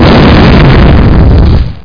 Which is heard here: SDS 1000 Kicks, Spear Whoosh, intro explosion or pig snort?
intro explosion